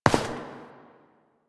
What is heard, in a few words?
Step; Warframe; Koumei